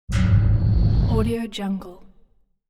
futuristic distorted whooshing

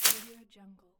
dry foliage impact

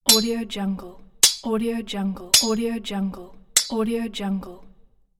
battle of sword

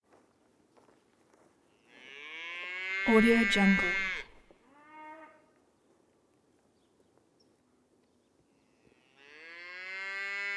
steer bellows couple mooooooooo; prosoundfx

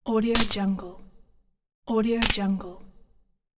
spark

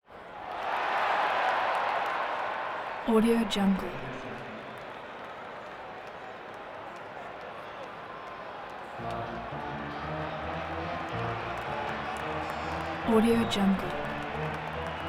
basketball game crowd organ cheering clapping

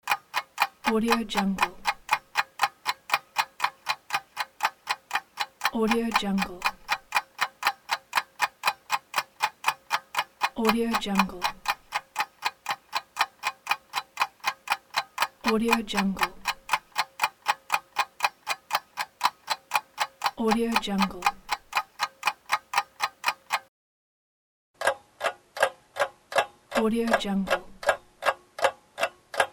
clock

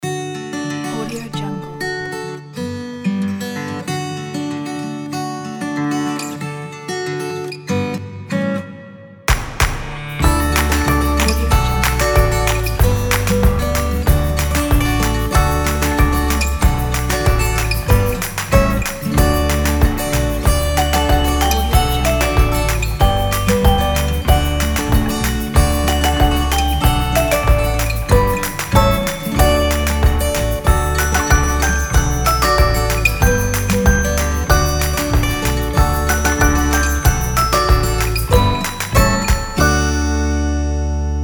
sunny guitars

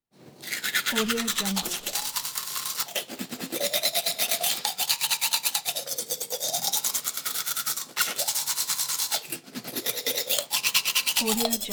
brushing teeth

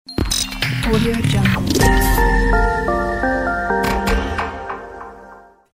digital logo; fruit-tartlette

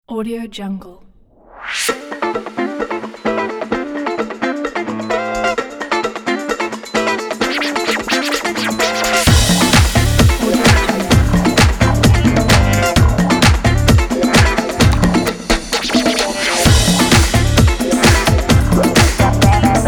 super funk

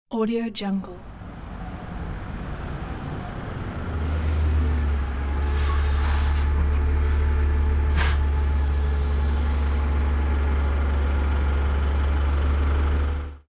mid bus arrival

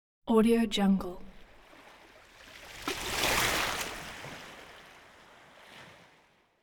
small wave on coast

